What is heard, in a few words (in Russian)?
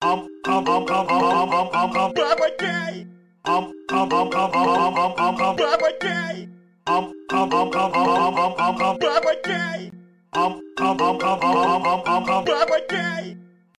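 iphone рингтон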